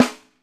DRY RESO, SNARES; Sampleheads - New York City Drumworks VOL-1

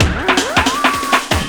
Fill, Drum N Bass Percussion Fills n Breaks

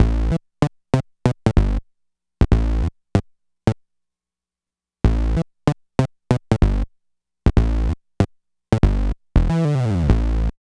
BASS LP; East West - Phat & Phunky CD2; 90's Sample CDs

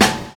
60 S BEAT; Univers Sons - Kitch 70s

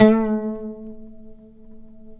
PLK OUD; Roland - LA Composer vol. 3

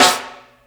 Jungle Snare, Snare One-Shots; KJ Sawka; Drum n Bass & Beyond; Drum Tool Box

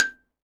XYLOPHONE, Percussion; ILIO

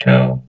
VOX VOX, Electro Vox; Sound & Vision; Gigapack II CD 2 (Roland)

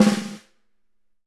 Buzz Roll; Digital Sound Factory

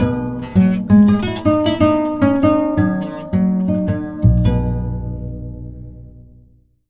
KORA; Sonic Foundry (Sony Creative Software); Continental Drift Aftershocks; African

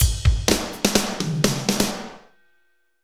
Medium Tekno, Drumscapes Roland; Northstar - Drumscapes Roland

